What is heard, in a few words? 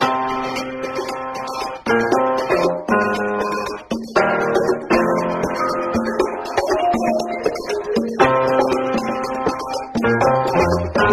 piano vibes, loops, phonky